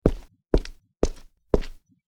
footsteps on concrete; Sound FX

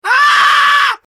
scream in fear

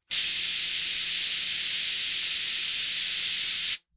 Air hiss

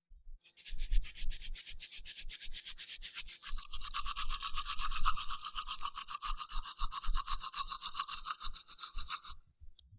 brushing teeth

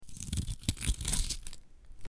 Potato peeling; Sound FX

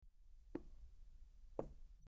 quiet footsteps

